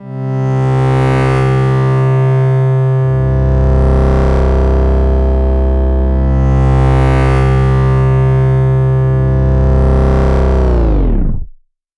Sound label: Bowed, downbeat